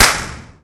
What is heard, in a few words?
Alley